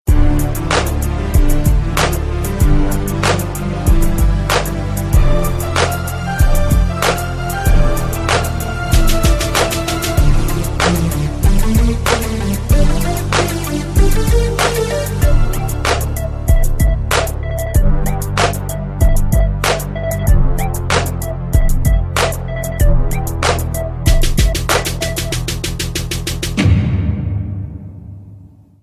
best bass sound

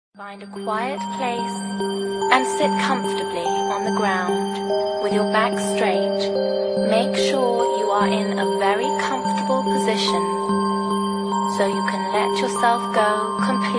guided relaxation dub

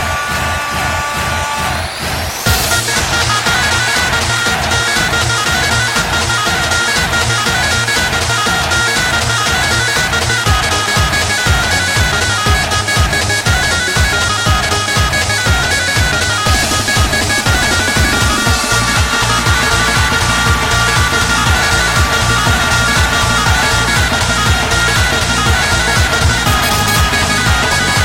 Avar Etno Lezginka

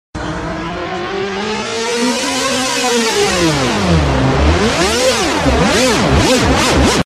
drummer beat; rock

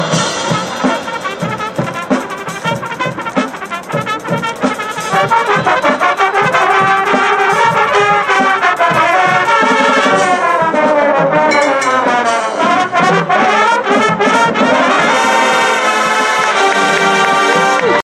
Brass Feature, ringtone

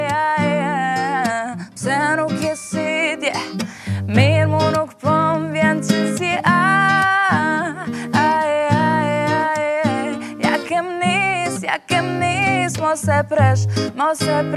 acoustic

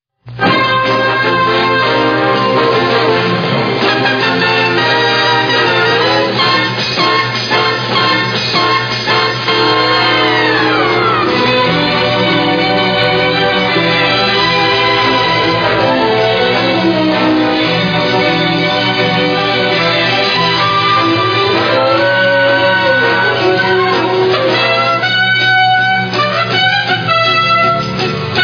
Sms

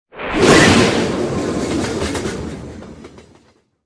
fast train

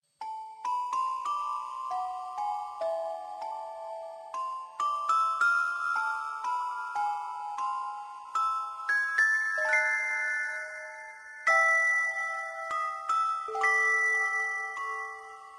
creepy christmas, ringtone

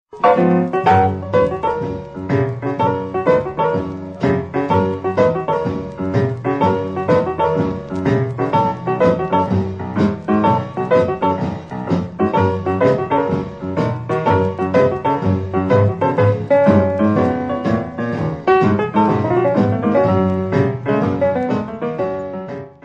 basement boogie

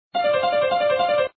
notification

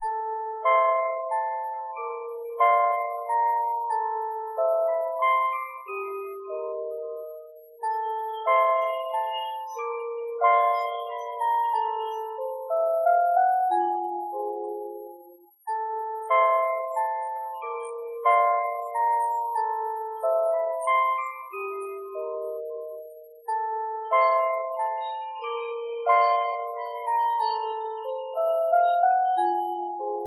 music box gothic; rock

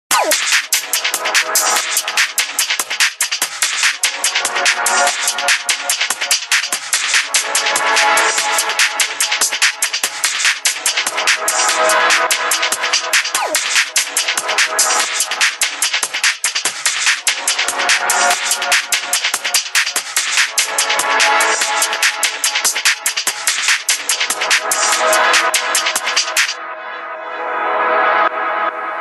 htc touch ringtone; ringtones

